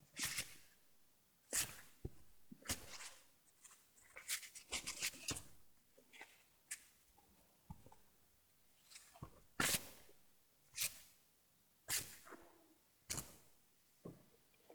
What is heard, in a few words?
Shuffle Cards Slow